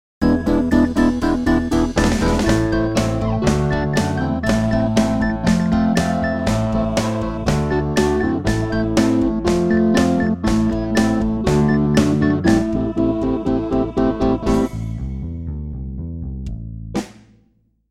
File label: Hohner pianet n; pianoteq; Modartt-Pianoteq; Hohner Collection